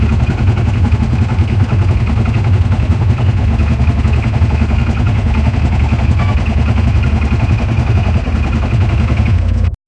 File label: close up recording of a v, locomotive